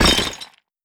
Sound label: win Refill; netent